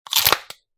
骨が折れる音

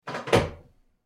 扉を閉める音

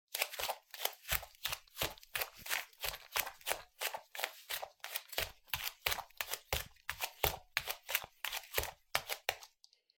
フローリングを裸足で歩く

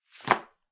ゴム手袋を置く音

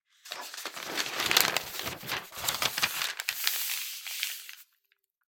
新聞紙をめくる音

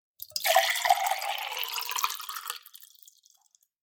グラスに水を注ぐ音

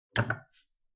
包丁を優しく置く音

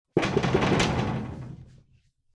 和室の扉をノックする音; door

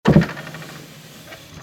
日本の民芸箪笥タンスを開ける音; chest

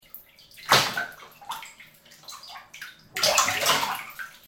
お風呂で一緒に入ってる人の腕を勢いよく離す音

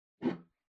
グラスを取る音

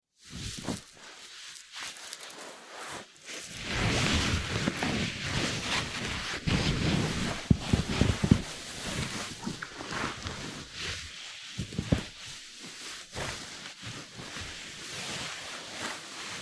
布擦れ音

